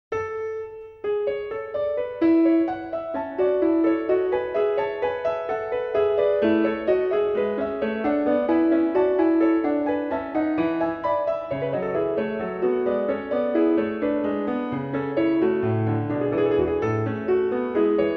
Fugue in A Major; BWV 864; BACH; WTC I

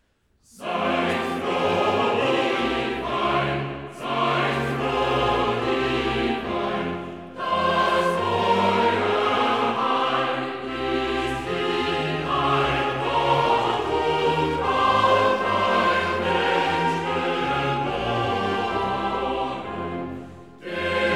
Chorale; Seid froh dieweil; BACH; Christmas Oratorio

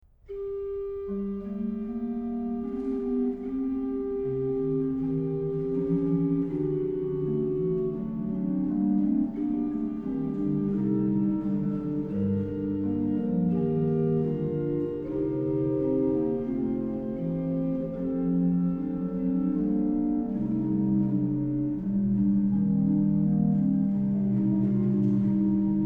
Herzliebster Jesu, was hast du verbrochen, organ; BACH; BACH ORGAN WORKS; Chorale Preludes

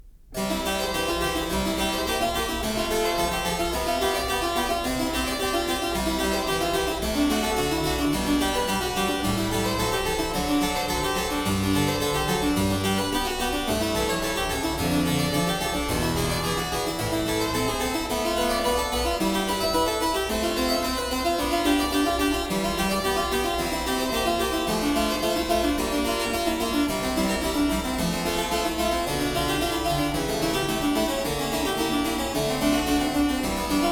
prelude; BACH; WTC 2; Prelude 3; BWV 872

